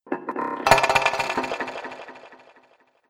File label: Droid Metal Rattle, Robot Sound Effects